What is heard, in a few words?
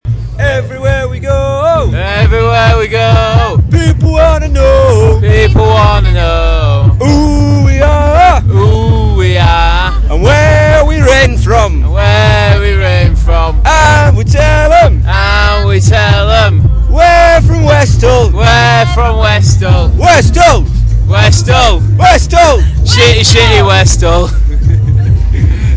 road trip song